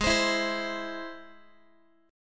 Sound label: Ab Augmented Ab, Guitar, 4A, 4E, 5A, 5D, 5G sharp, 6C sharp; x,16,15,14,16,x; Ab+ strummed